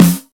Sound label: strong bottom end snare f key, snare sound tuned to the F note; snare-drum-samples